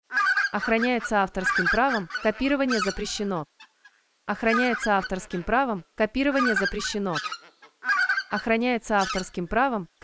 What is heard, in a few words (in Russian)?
белолоб осада стадо, многоголосая осада стаи